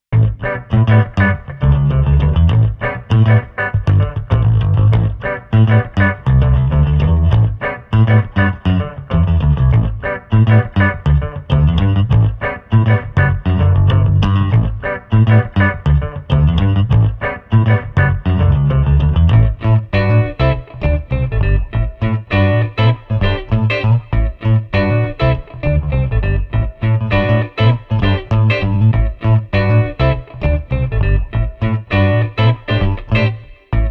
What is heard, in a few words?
Guitar Bass Funky Rhythm 100